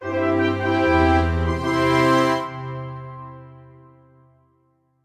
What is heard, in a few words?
Fanfare Wins; stickman super athletics; 3ds